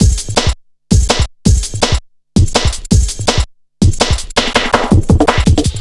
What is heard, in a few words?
Drum Bass, Drumloops, 165bpm